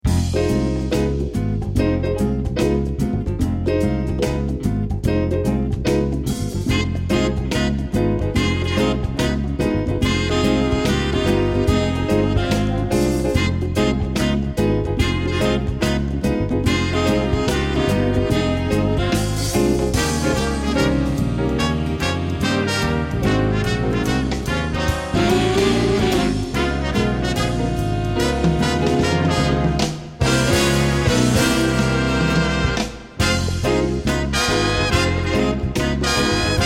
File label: SWING BAND; BIG BAND